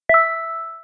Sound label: text receive; Sound design for the opera composer: concepts and methods; Vicky and Albert audio cues